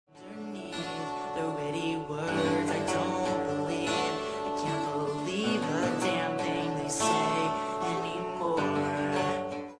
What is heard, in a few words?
acoustic